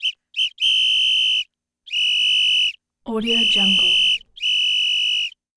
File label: sports whistles referee whistle referee short; human sounds